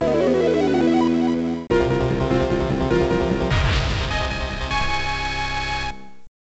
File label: Starting Point (Start); Nemesis 95; Gradius 2; X68000